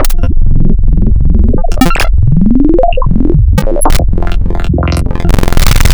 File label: Misc Assymetrical, wavetables; Architecture 32